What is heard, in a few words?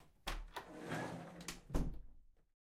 Open Door; MiSide